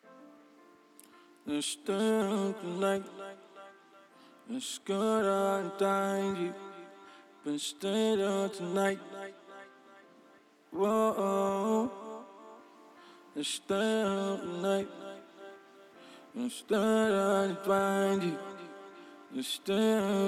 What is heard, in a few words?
PIANO LOOP VOX